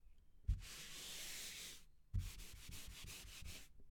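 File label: Chalkboard Eraser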